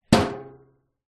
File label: household bbq metal lid close; zapsplat